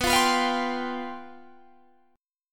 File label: C Major 7th Suspended 4th